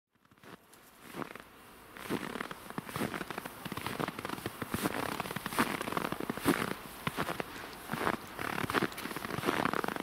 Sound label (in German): Knistern Schnee